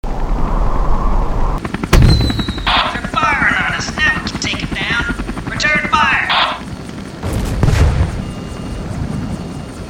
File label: Missile; Infiltrating The Airship